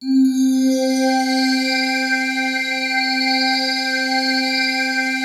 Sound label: PAD, ATMO PADS; Best Service; XX Large